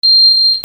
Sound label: ALARMS